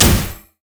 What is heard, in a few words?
impale; LoL; skarner